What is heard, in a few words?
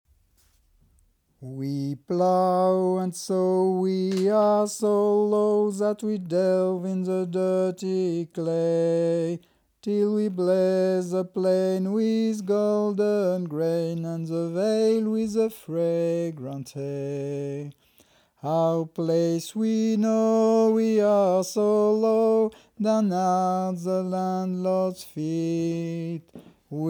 Voix; Song of the lower class; Version originale Ernest Charles Jones (1852)